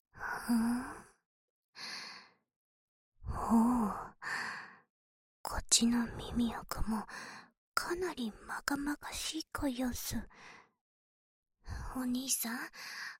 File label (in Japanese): 左 奥耳かき, ASMR; 耳かき小分けトラック